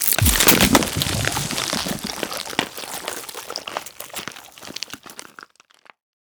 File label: Memory Three Poison Inject; Warframe; Sacrifice